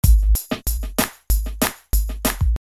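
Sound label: slow one, rhythm loops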